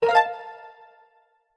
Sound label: Map Open; Open and close the Map; Skyward Sword; zelda